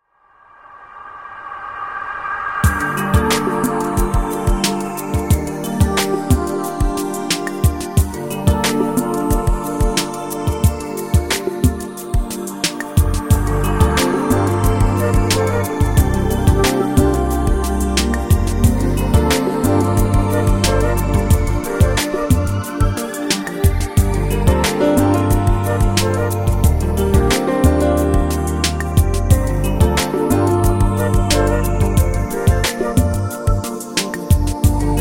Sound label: Jazz